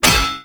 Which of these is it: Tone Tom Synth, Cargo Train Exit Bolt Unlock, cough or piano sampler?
Cargo Train Exit Bolt Unlock